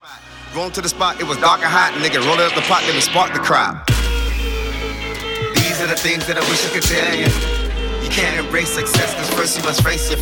Hip Hop

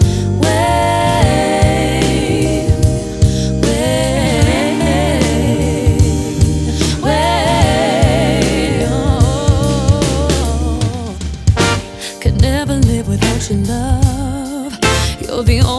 R&B Soul

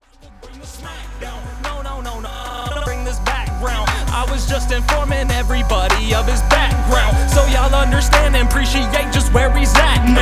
Hard Rap

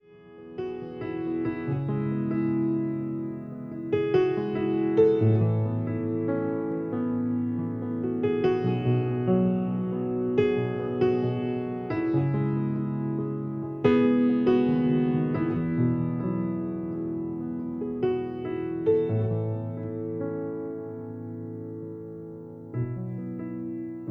Piano Spiritual

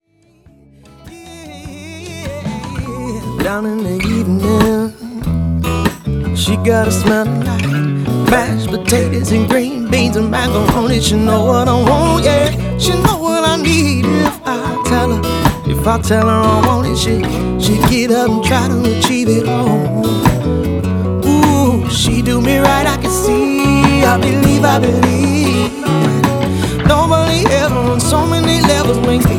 R B Soul

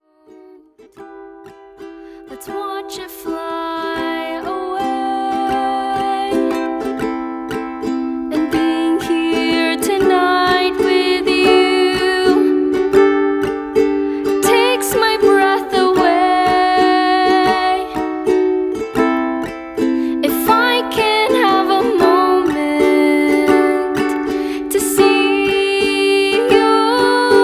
Acoustic Indie

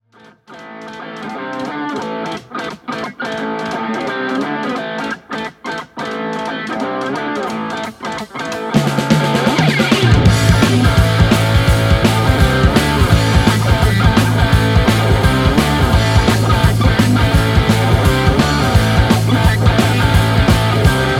Southern Rock

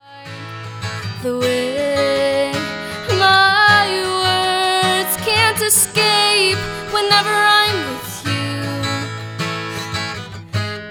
Acoustic Folk

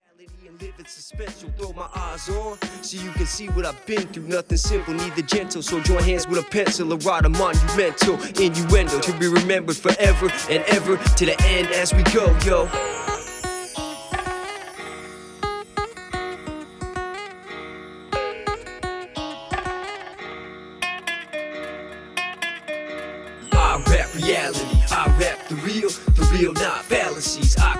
Raw Rap

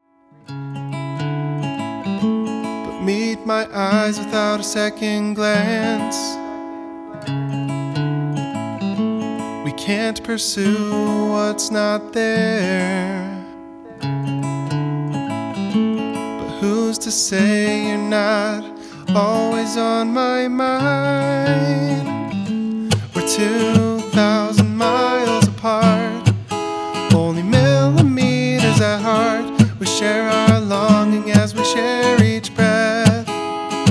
Acoustic Folksy Lovesong

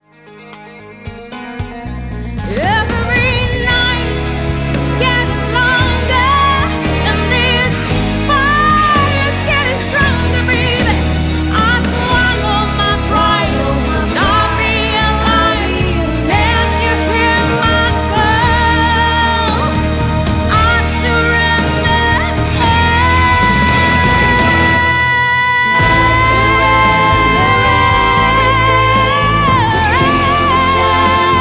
Pop Ballad

